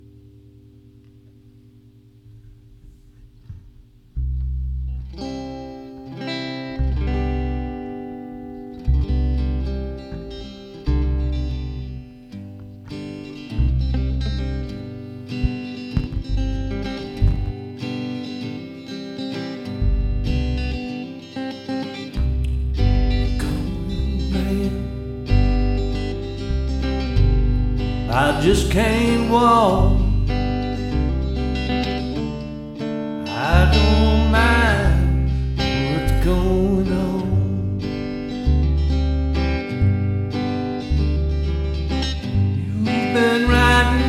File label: Jam; r1 studio sessions